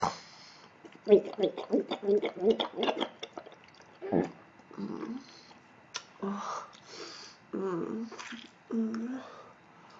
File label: gagging gagging